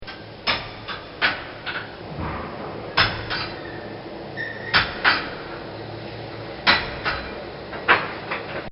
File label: Blacksmith Shaping Horseshoe; Animals; Bobby Cole